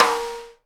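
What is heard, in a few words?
SIDE STICK, Live Snares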